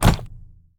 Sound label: Charm Block; Hero Blocker